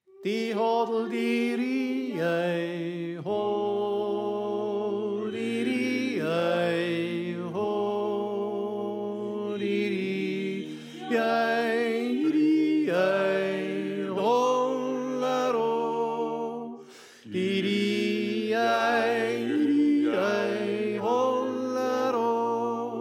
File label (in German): Tenor